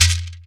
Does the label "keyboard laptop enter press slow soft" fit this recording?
No